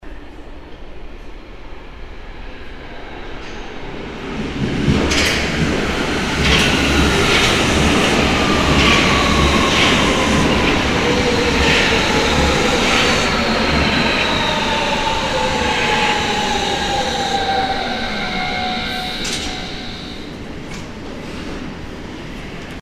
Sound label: subway arrival